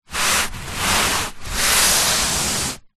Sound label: sport exercise mat foam thin shift slide scrape movement on carpet